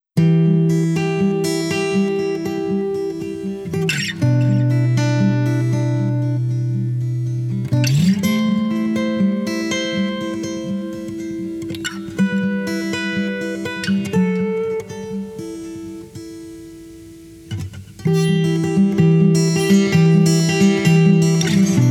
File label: e moll h moll